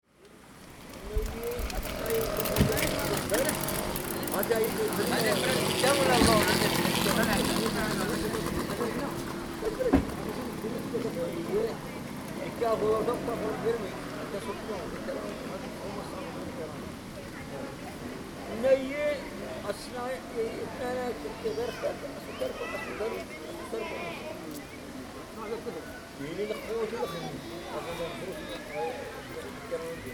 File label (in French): tiznit garde corps; resonances oasiennes